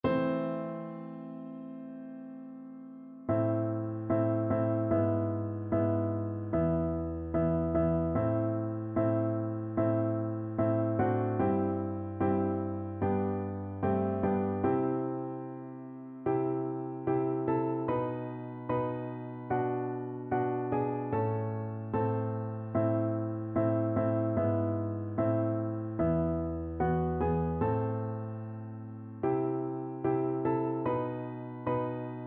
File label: beethoven, piano duet